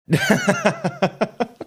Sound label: chuckling